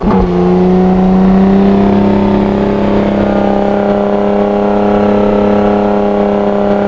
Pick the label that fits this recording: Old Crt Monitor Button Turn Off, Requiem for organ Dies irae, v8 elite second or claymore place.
v8 elite second